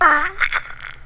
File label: pain, csgo french bot; csco